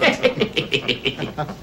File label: sarcastically laughing; people